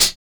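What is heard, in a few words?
HH, hi-hat; X-Static Goldmine 1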